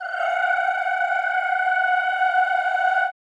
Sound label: CHO F#5, Rrrr; Sound & Vision; Gigapack I CD 2; CHO Mmhh + Rrrr